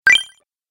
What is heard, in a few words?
Magical Token, Magic Sound Effects